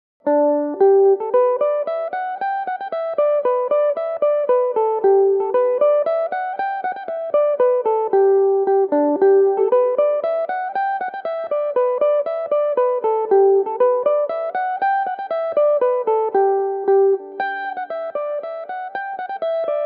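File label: polka, G major, mandolin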